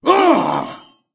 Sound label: Wound; Stormcaller Brundir